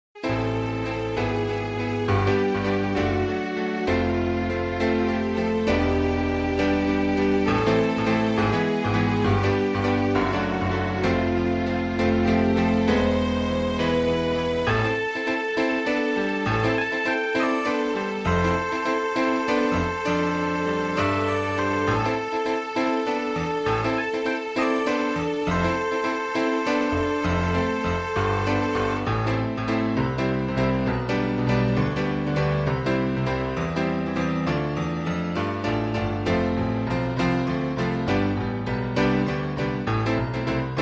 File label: Piano Solo, Radio Advert; Gabriel Knight